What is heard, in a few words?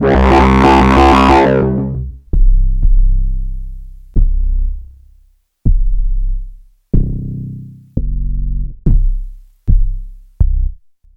Bass c